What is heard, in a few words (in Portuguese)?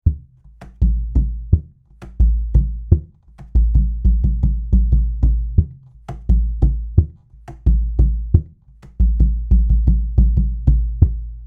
TANTAN